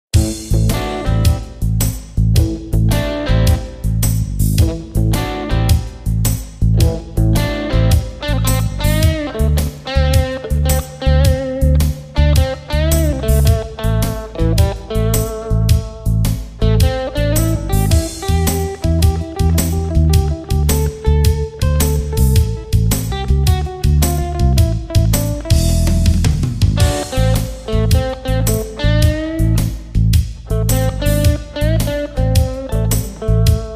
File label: blues techno